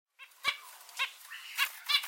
Zebra finch meep

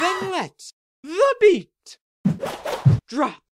drop the beat

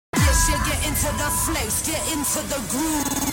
Abcd Notification

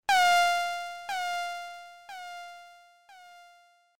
Echo

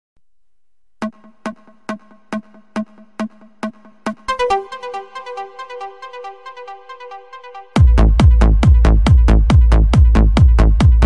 Techno

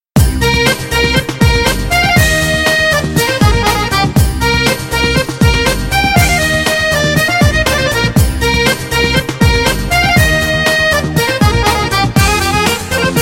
Balkan Turbo Folk

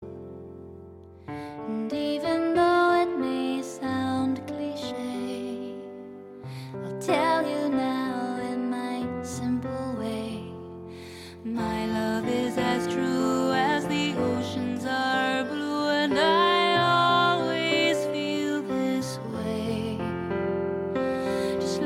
Lullaby

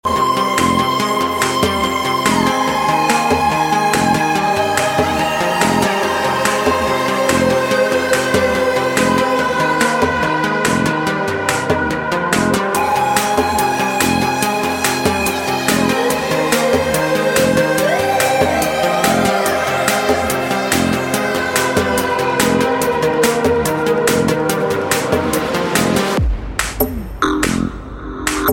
Psychedelic

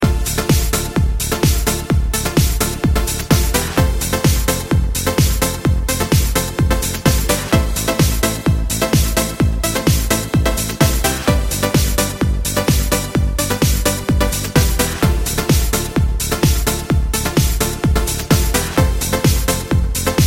House Ringtone

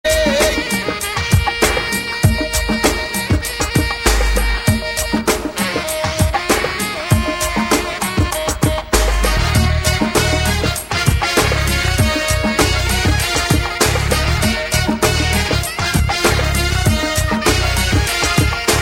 saxo

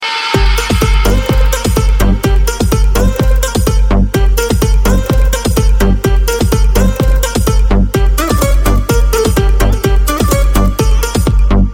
Iphone4 Rain Tones

